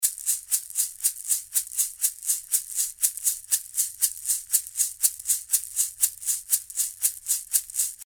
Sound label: 120 SHAKER MED EIGHTHS